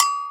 Agogo H; EMU Orbit-3

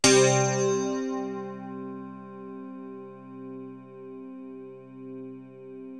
syn c3, YAMAHA TX16W Eacoustic; Retro Sampler

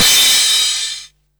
CRASH, Dance Mega Drums; Best Service

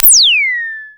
HI ZAP; Back In Time Records; Big Bang; THE TECH

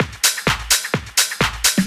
Clubhouse Loop 128 BPM

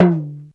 talking drum; Greytsounds; EXOTIC PERC

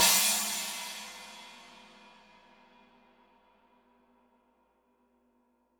CYM; Bob Clearmountain Drums I

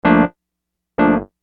Whirly; Construction Kit; HIP-HOP; Sonic Foundry (Sony Creative Software)

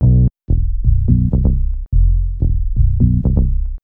Bass Line; Eclectic Electronica

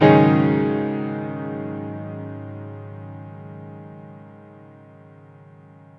Piano stack (G#) sus; Sonic Foundry (Sony Creative Software); Ugly Remnants VOL-2

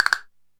DM5 Perc, Alesis DM5 drum machine

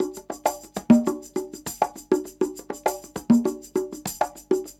Percussion, R&B Drums

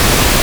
PINK NOISE; AKAI S6000 CD-ROM - Volume 5

